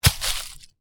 Apple lands on leaves on the ground and bounces slightly; Miscellaneous Impact